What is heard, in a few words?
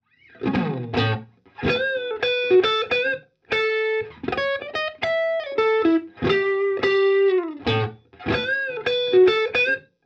drive front